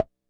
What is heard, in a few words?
Tom Hi; One Shots; Drums